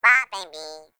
Flying Robot Voice, Ambience; Warframe; Veilbreaker; Kahl Quest

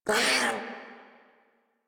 Kavat Vocal Pain; Warframe; Khora

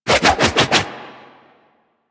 Wraith Reaper Melee Charge Spin Whoosh; Warframe

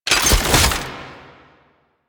Sniper Swap To; DE; Warframe; Frumentarius; Exalted Sniper

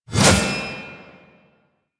Shield Whoosh; Warframe; Duviri; Duviri Dax; Weapons; Duviri Mace And Shield

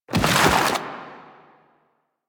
Ground Spike Dissolve; Warframe; Zariman; Void Angel; Abilities

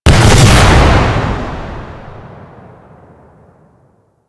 Frozen Barrel Explosion; Explosions; Warframe

